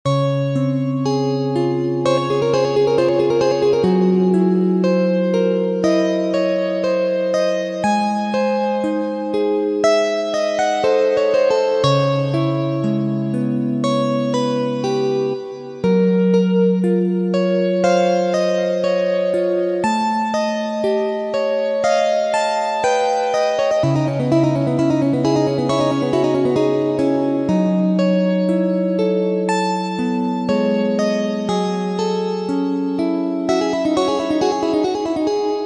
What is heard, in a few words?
Sleep Hypnosis